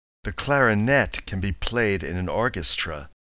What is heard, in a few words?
clarinet